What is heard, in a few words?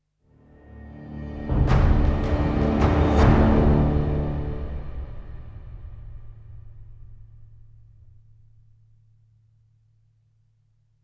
MUSIC Predator Fail; batman arkham knight; SideStory